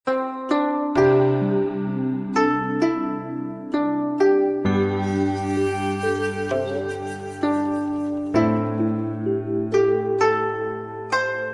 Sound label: Guqin; گوچین